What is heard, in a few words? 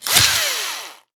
drill hit